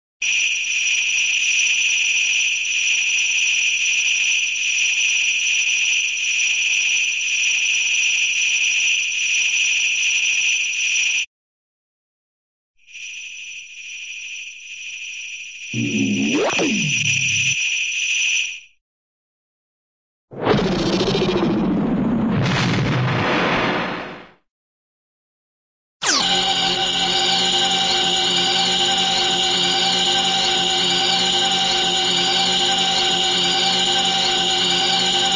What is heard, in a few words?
sci fi space scatter ray; cartoon